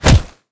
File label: shoulder pound, zombie impacts; creatures